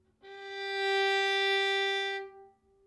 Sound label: Violin G 5 Tenuto Non Vibrato